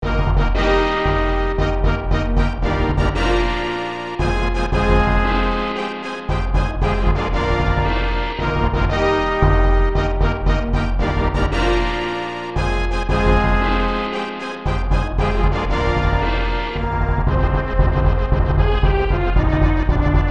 Fanfare; Super Keiba 2; SNES

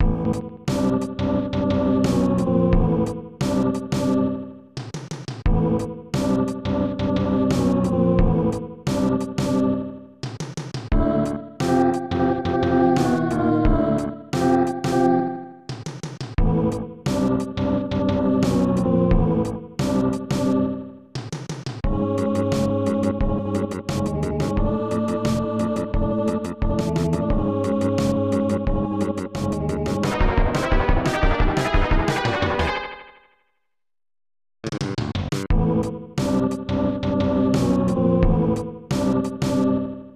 Dungeon; metal max returns; snes

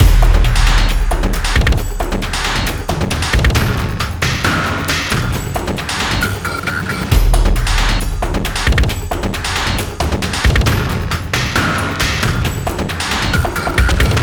Desert Small Fight; sky break

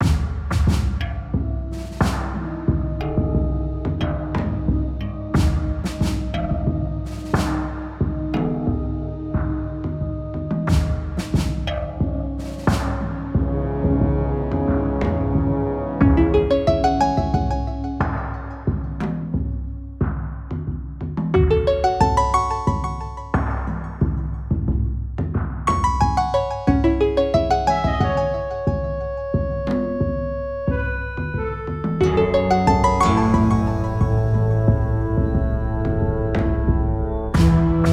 Ruin Loop

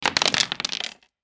Ui Camp Crafting Rolling Die; Angry Birds Epic

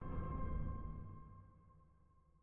Desert SFX, Desert Mech Node Success C4

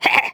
Bee Vocals; Levels Hub Bayou 01 Target Hungryfrogs

